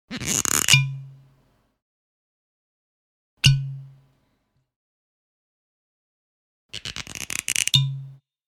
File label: Uncorking, Popping sound of uncorking a bottle x3; Pops (Sound Effects:Objects)